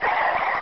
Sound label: zo alert, zombie; hl1 npc